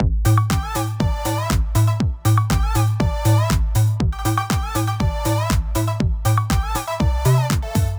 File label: Paris Stupid Party Music; Clandestine